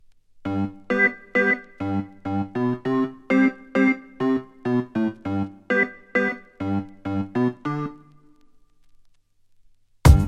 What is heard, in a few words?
answers Music